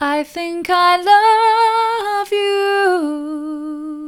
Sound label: mid, vocal